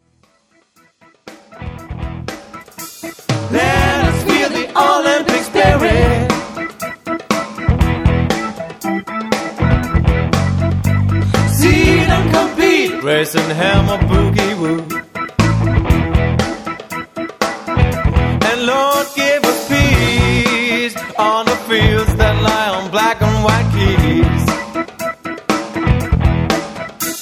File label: piano games